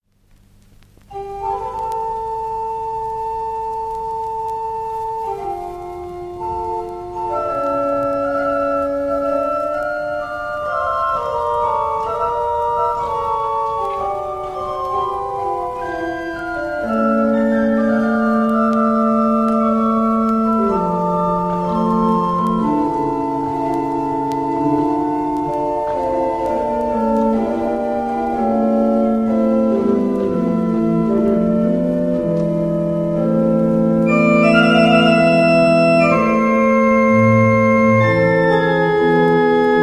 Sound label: For a Double Organ